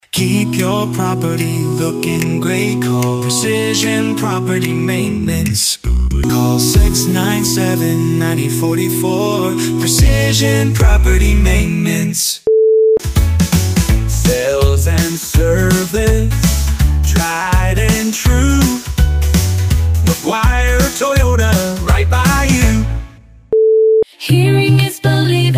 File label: Jingles; station and client jingles; AI